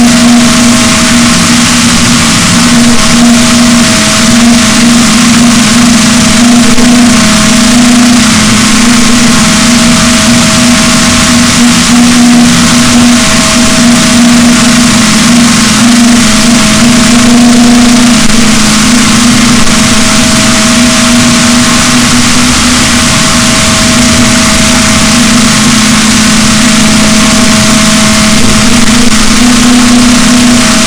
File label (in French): rorquals, boat, Balaenoptera Physalus; SNR 6dB